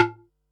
Perc, Percussion and FX; Pearl SC-40

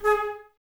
Flute, Woodwind

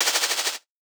Percs, Elektron MachineDrum; SPS1 MKII

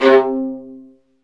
String Sections, Violas Marcato

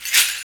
Chimes & Seeds, shakers

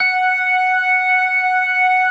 CLASSIC C3, Synth and Organ

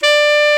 SAX A. MP D, Alto Short; Roland LCDP07 Super Sax

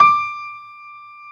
pno D5, Piano Grand; AKAI S-1000; Retro Sampler; Piano Grand PF St

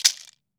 maracas; Cuba

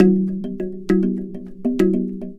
Box Drum Night, Worldly Rhythms; Sonic Foundry (Sony Creative Software); Ma Ja Le Ethereal Textures

